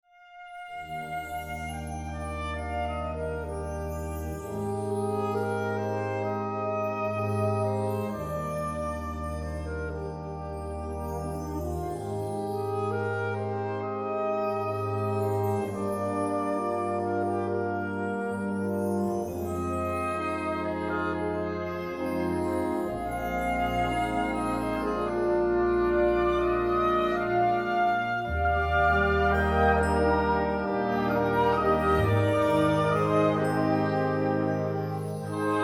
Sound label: Fantasy for Wind Ensemble